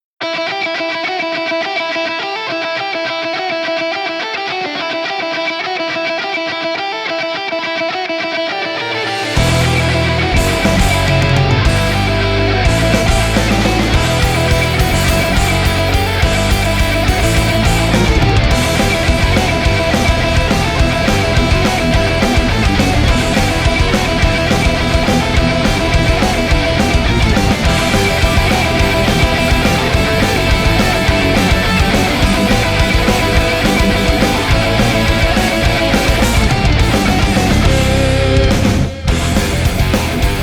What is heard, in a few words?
(cover) [instrumental]